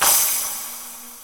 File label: Dirty Crash, Drum Hits; Zero-G; Soundclash